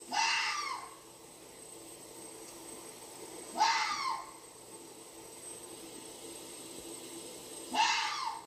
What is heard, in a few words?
Fox cry